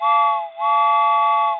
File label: train whistle